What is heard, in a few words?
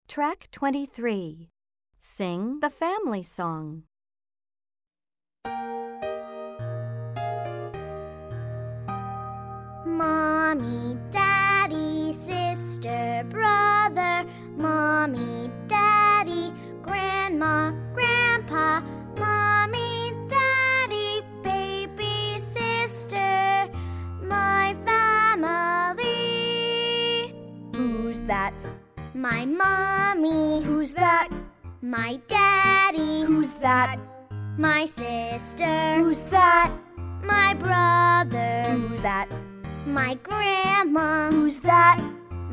Sing the family song